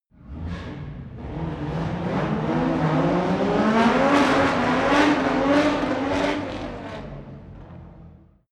racing car f1 accelerate heavy

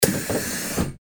bus door closing

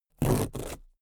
cardboard box big rip open

